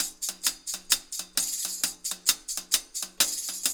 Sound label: Roots Reggae; Sounds Good - Roots Reggae